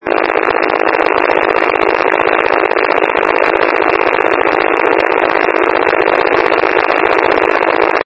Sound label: wcalert, County Wide Paging; County Wide Paging Archive